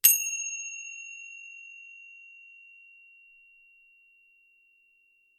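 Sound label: tibetan cymbal contact sound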